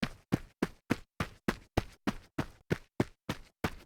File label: run on grit